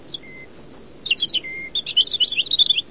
Pettirosso Erithacus rubecula

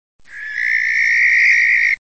Barbagianni Comune Tyto alba

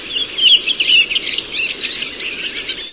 Tordo Sassello Turdus iliacus

